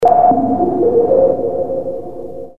BLEEPS

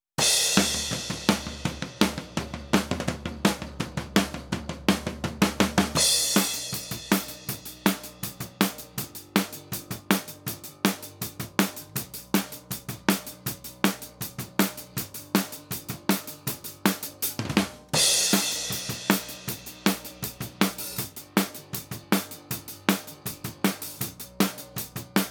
Seven OH snr; Seven creatures down

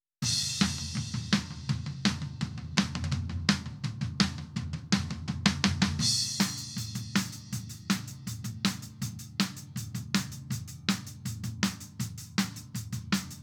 Seven Racktom; Seven creatures down

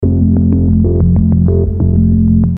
MOOG LOOP

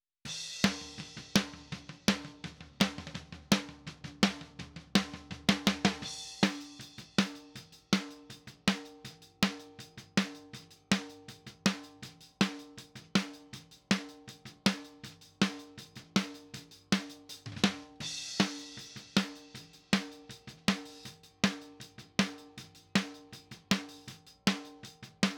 Seven Snr; Seven creatures down

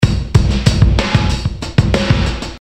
drumloop

